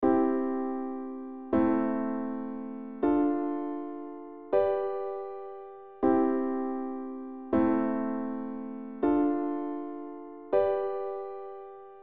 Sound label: I VIm IIm V, Progression Accords; Les bases harmonie